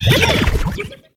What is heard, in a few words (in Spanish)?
Grito; Mareanie